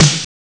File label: drum; ST-XX Amiga Soundtracker Sample Packs